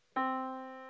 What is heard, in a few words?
Yamaha Disk, piano sound